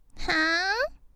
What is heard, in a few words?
hope noises